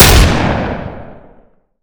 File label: saw shotgun; weapons; ModernWarfare